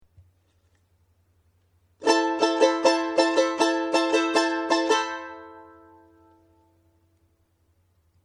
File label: huayño, charango; folklore